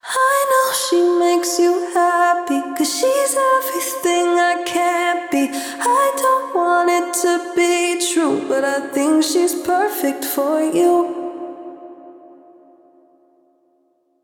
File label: Glow Female Vocal B